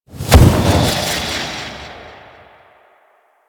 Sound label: Teleport; Stalker Assassin; Warframe; Warframes